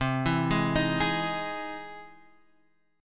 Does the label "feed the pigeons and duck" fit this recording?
No